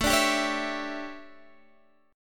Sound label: D Major 7th Suspended 2nd Suspended 4th A, chord